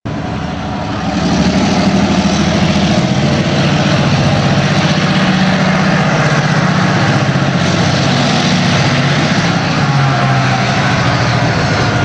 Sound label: EMD GP15 hard work